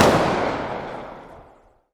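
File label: m249 distant, gun; arccw go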